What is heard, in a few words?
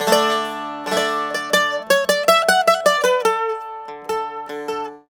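tzouras, D; TSOURA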